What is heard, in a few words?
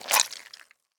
Foley Squish Juicy Squirt; Frogsong